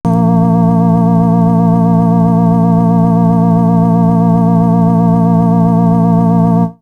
Synth; Coldcut's Kleptomania VOL1

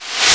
REVRS NOIZ, PERCUSSION REVERSE

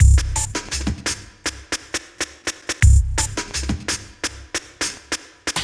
Beat, Futurist Drum & Bass; Drums & Percussion

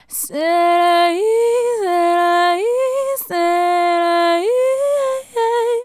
Female Solo, Hip-Hop and RnB Vocals

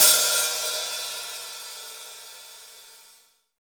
V-Pop Open HiHat; Roland BK-5; Hi Hats

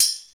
DMA 727, DIGITAL TR-727; 90 s Sample CDs; Sound & Vision - Gigapack I CD 1 (Roland)

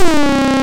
FIX SYNC, ARP Synths

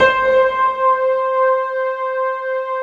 ISLAND PN

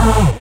SYN TEK-K, dance synth; Sound & Vision - Gigapack I CD 2 (Roland)

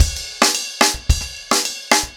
Crash 110BPM, ACOUSTIC DRUMS; Sonokinetic - The Roots Drumloops

